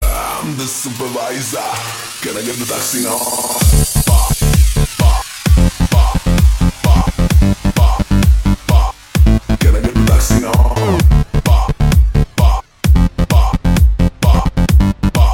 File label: elektro, electronic ringtone